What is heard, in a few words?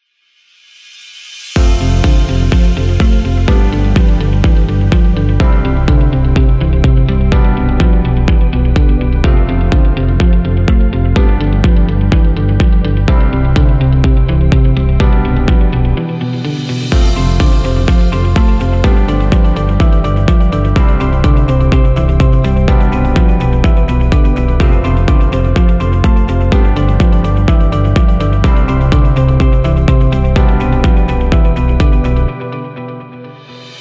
Corporate News